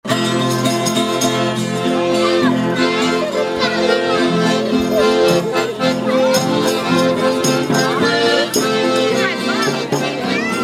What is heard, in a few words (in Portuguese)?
folia de reis instrumental; folia de reis do vale da pedra